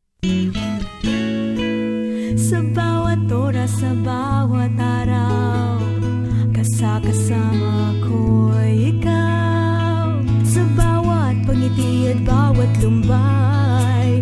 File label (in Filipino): Acoustic Version